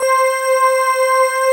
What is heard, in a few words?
BELLS, JV 1080; Pro-Rec - Synthsonic